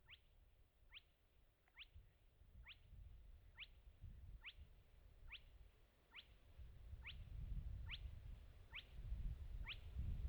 småfläckig, fågelljud

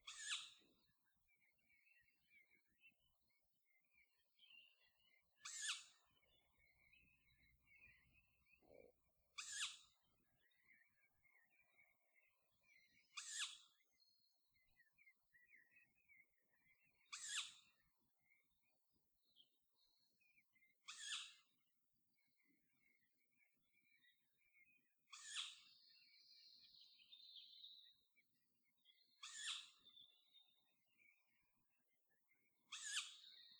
kattuggla; fagelljud

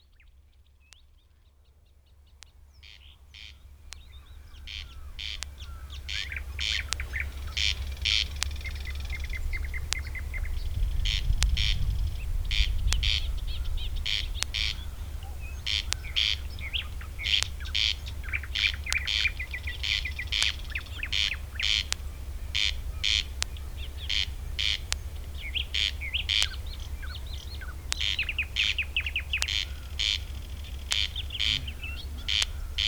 kornknarr; Mörbyudd, Öland 3 juni 2016. Knäppningarna är ett elstängsel som jag inte uppmärksammade!; fågelljud

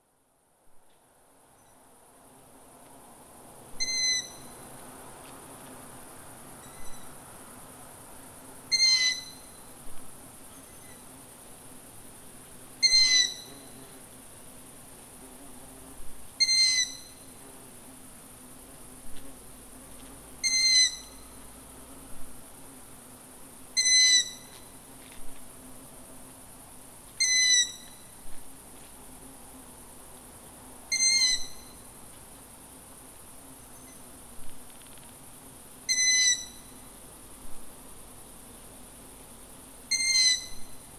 hornuggla; fågelljud

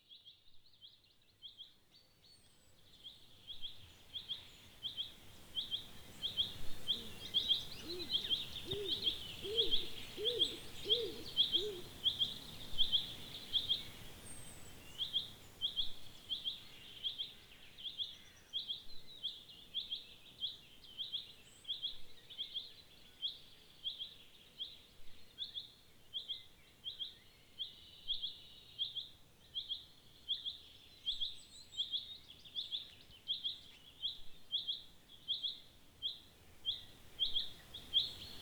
skogsduva, fagelljud

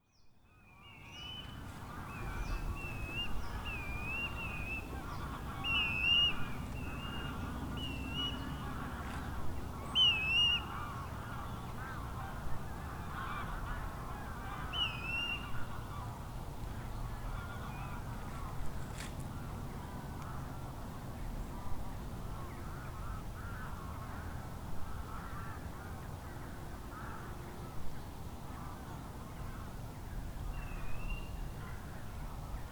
kustpipare; fågelljud

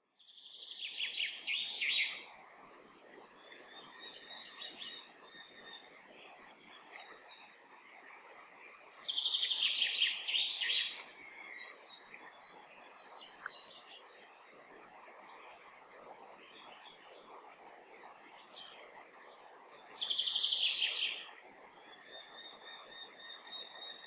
lärkfalk; Hökaviksudden 14 maj 2020; fågelljud

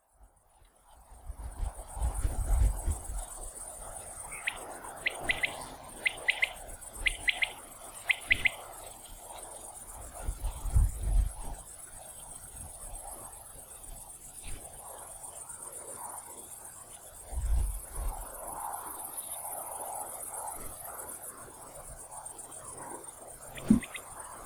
vaktel; fågelljud

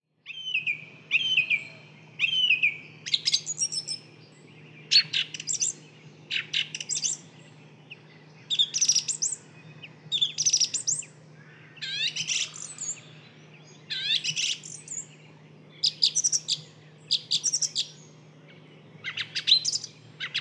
taltrast; fagelljud

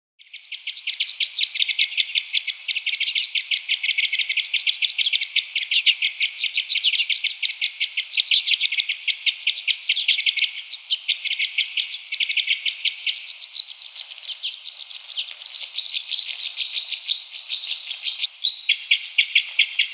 bandelkorsnabb; fagelljud

